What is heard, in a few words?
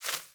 Sparrow Quick Move, Movements; Animals